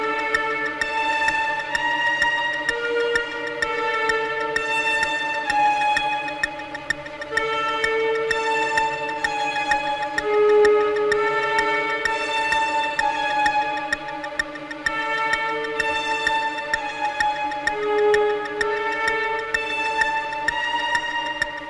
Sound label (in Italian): Tremolo Ossesivo